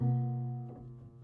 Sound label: Prepared Piano